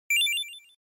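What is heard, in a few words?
Game Boy General Pitch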